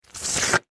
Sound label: Suction Mud, Texture, Suction, Mud; Water; BlastWave